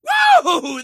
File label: woo